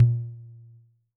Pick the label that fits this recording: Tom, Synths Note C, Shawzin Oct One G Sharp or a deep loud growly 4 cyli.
Tom